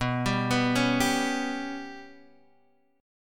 B Suspended 2nd Sharp 5th down Guitar Half Step Down